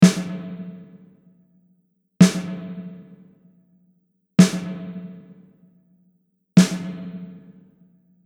Snare Spring Reverb

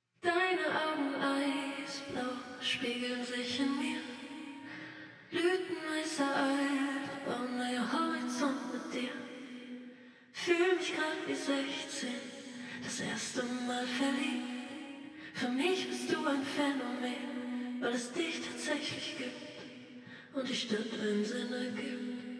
Reverb Side Filling Comp

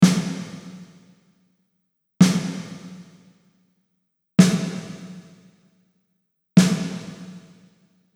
Snare Plate Reverb

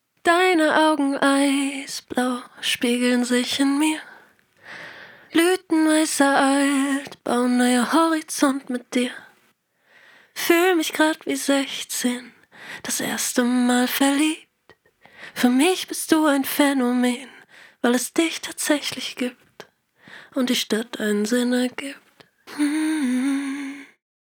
Vocal Reverb Side Walls EQ

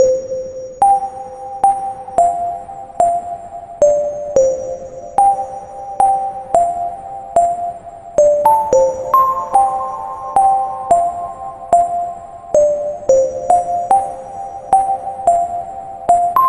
bellverb

